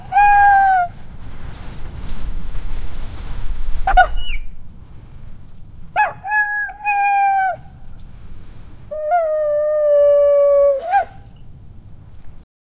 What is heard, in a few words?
a galloping sound, whistle